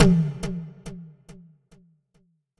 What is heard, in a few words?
enemy death; clash and crowd